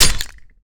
inc bounce, incgrenade; weapons; Counter Strike Model Pack; CS2

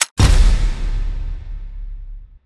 case awarded rare, ui; Counter Strike Model Pack; CS:GO; panorama

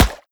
water, bullet impacts; physics; Half Life Model Pack

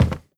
step light, footsteps cardboard

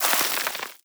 debris sand, bullet impact debris

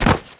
flesh impact hard; Half Life Alyx

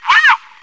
whoo, radio; Counter Strike Model Pack; CS:GO

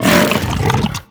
pull grunt, barnacle creatures; Half Life Alyx

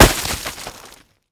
snowball hit; winter; Counter Strike Model Pack; CS:GO

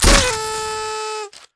taser shoot birthday; weapons; Counter Strike Model Pack; CS2

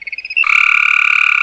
antlion grub stimulated; Half Life 2; Episode 2

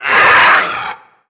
pain, headcrab reviver; creatures; vox

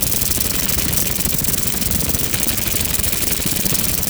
loop, antlion wings; creatures

